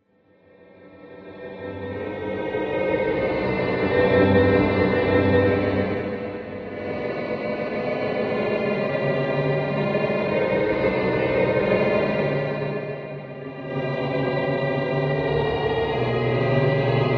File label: Music Eerie String, orchestral